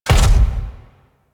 Mech Step; Nechro Mech; Vehicles

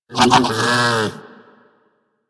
Armadillo Vocal Snarl; Characters; Warframe; Hunting Critters

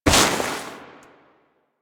Step Snow, Footsteps

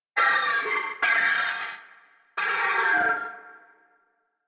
Sentient Death By Gun Vocals; The New War; Lua Valley Ext

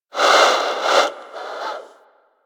Bird Snore; Warframe; Hunting Critters; Sawgaw

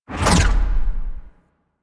Fly By D; The New War; New War Intro Moon; Archwing

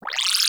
Sentient Trooper Link Beam Start

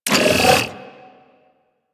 Servo Up; CTF Cephalon; Warframe; Ambience; PVP

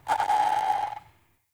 Corrupted Ode Two Creature; Ambience; One Offs

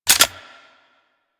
Cannon Fire Mode Switch Cannon; Grineer

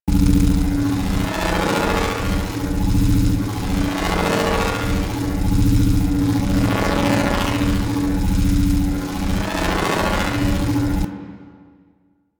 Interrupter Shield Loop; Warframe; Corpus

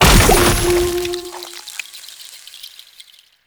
Power Shroom Proj Explode; Warframe; Nokko; Empowering Mushroom

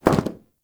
Vendor Leather Flap; Ambience; Soul Plane

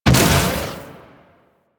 Spear Shot; Weapons; Warframe; Tenno; Priest Spear

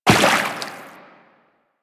Step Water, footsteps; Warframe; Teshin

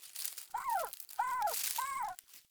Beaver Idle Chatter; Encounters; Animal Rescue

